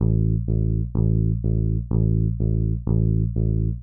DEEPHOUSE BASS 125 A

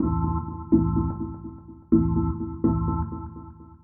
DEEPHOUSE ORGAN 125 A

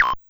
BEEP; VOICES

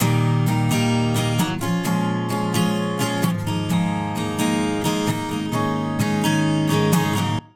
acoustic

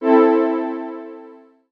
KEY SHOT TEKNO 140 A; Techno2; Singleshots

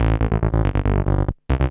SNTH BASS ELCTR 140 A, Techno

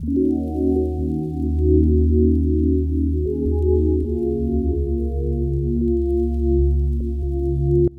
120 Ebm7 night move, Music Loops; Touch Loops; BlissedOutBeats

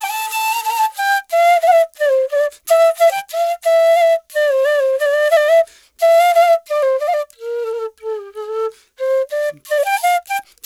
ETHNO EXTRA 90; ethno2

